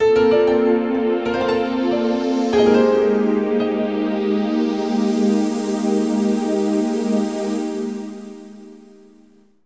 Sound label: Menu; WarTech Senko no Ronde; Xbox 360